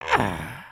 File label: Walk, creatures organic